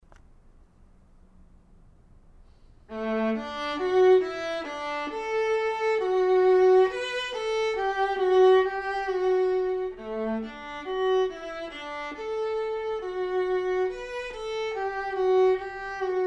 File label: Bourree Vla; melbay; Handel